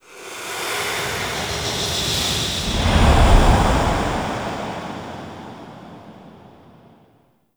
Reaper On Bridge Turn Whoosh; Wraith Quest

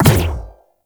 Alt Fire Projectile Bounce; Weapons; Warframe; Corpus; Tenet Quanta

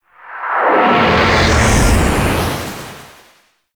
Weapon Projectile Whoosh By; Enemies; Abilities; Ethereal; Warframe; Zariman; Void Angel

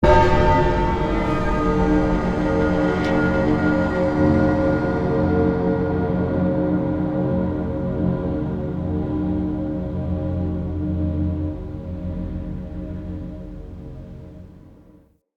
Bell Puzzle Ring C

